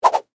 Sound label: Whip Whoosh Double; Foley; Whip Sound Effects; Whips (Sound Effects:Objects); BlastWave